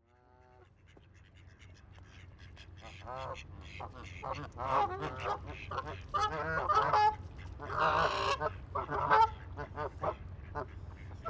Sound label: When Geese Go Bad